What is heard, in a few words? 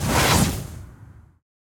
Teleport out